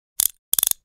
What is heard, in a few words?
Cuff